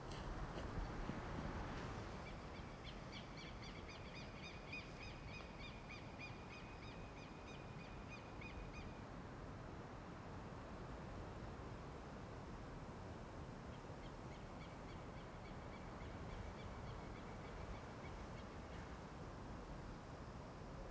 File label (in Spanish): AMB EXT AMANECER CAMPO Tacuarembo UY; Est La Gloria